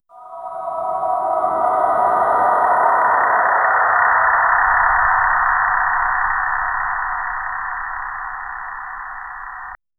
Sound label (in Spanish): un dash que se transforma, transformations